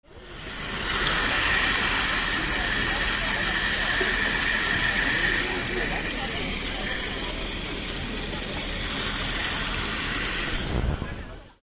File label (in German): wasser in kanister